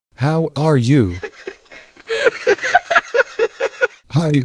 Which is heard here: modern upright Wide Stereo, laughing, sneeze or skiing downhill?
laughing